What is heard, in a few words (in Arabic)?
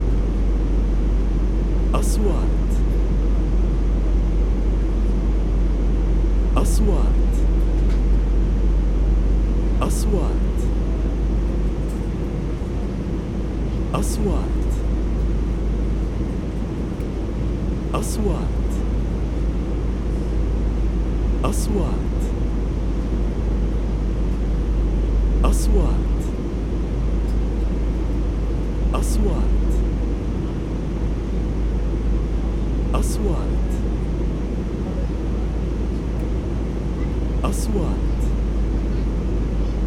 هبوط الطائرة, airplane landing